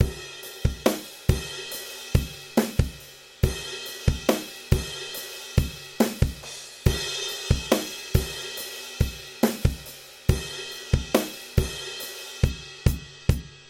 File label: drums